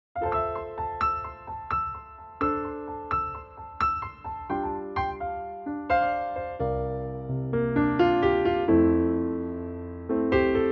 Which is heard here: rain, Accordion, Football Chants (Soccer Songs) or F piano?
F piano